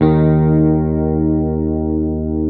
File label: Hamer 12, SUS, D#2, Bass; Sampleheads; Will Lee Bass Library